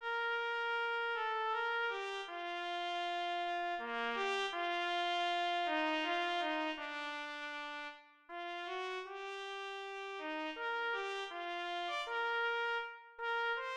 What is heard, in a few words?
trumpet